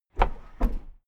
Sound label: car lift down short